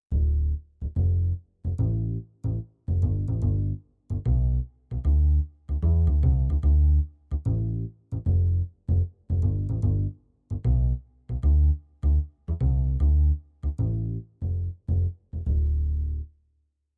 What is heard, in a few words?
Roland RD-800 Bass